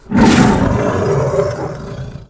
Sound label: tiger; Gizmo Project 4.0.4